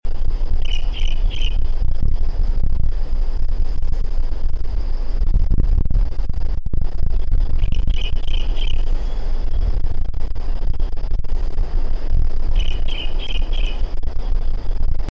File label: mangrove finch